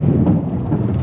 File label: Sfx Bowling